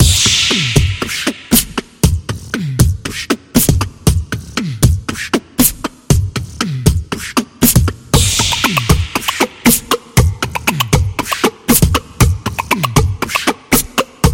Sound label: Beatbox